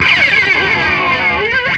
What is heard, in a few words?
GTR NOISE, Funk Gtr & Wah; PlatinumPhattCD2; E-MU Producer Series Vol. 8 – Platinum Phatt